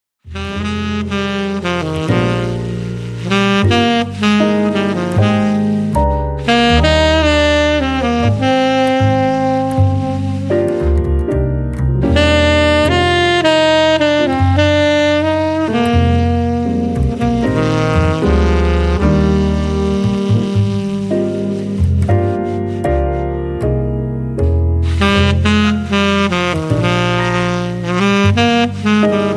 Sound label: jazz; jazz swing bands